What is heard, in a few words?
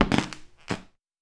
Creaky Wood Floor